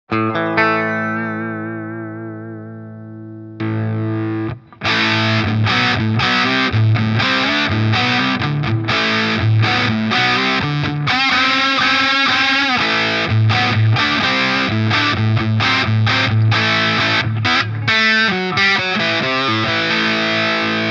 Earthquaker Devices White Light V2 Gain max Weight max Comp